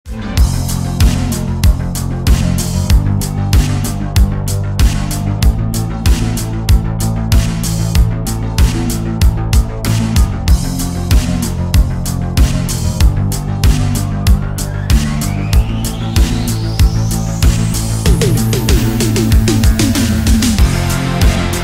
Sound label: Backing Track Jam Am